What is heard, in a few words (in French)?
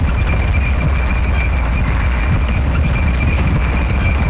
chenilles, tank; svencoop